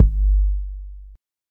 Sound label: big kickdrum g key